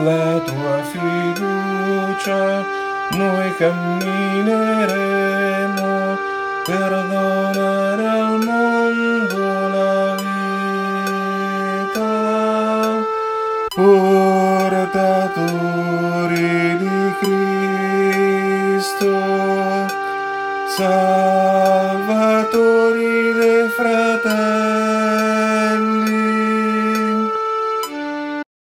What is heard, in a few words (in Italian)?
Madre Fiducia Nostra Contralti